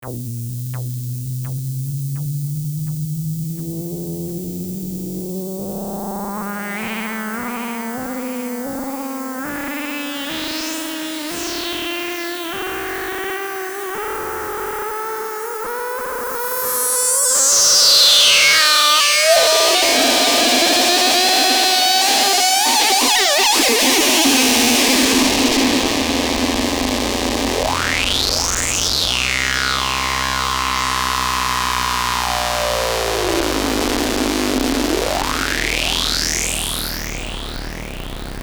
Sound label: Formant